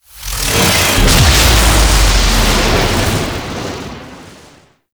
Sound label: Ship Void Teleport; Ambience; Gameplay; Warframe; Corpus Ship Remaster